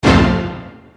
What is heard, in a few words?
surprise; anime